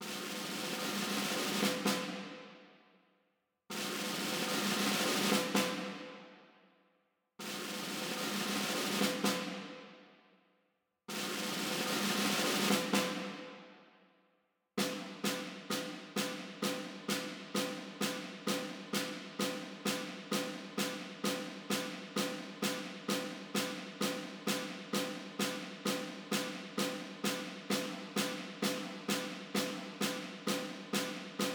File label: Orchestra Snare Drum; McRoMusic; Summoning Circle